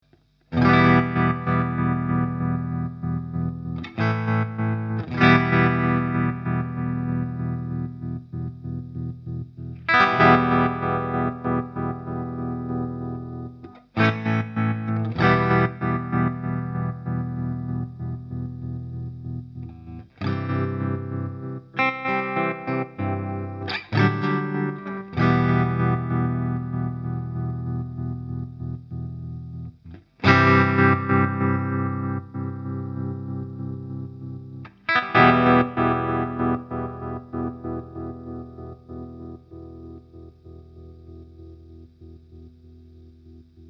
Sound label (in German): random welle; Walrus Audio Monument